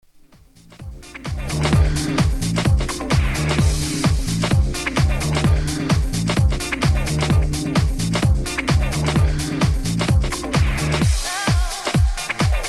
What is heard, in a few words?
Mixing Show House